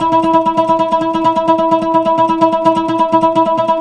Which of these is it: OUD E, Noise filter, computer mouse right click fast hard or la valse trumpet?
OUD E